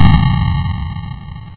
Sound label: torp boom; spacehulk